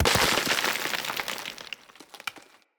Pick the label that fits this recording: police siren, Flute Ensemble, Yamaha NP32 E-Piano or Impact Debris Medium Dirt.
Impact Debris Medium Dirt